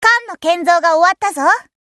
Tone

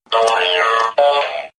drill

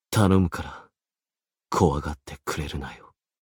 equipment horse; Oodenta

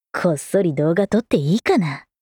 battle warcry

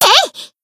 Battle Shout; Zunko Newyear

